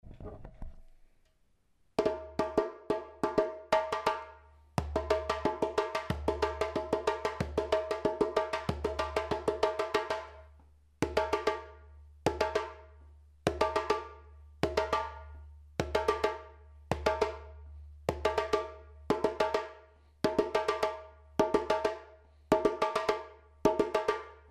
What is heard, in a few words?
solo slow